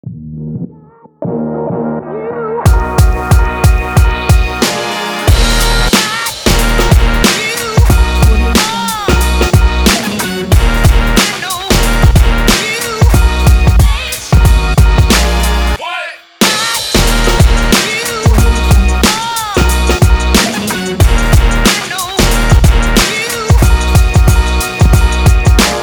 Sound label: hiphop is rap